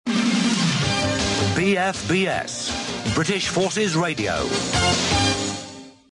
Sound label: news jingle; bfbs